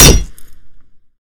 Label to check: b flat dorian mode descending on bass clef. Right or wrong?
Wrong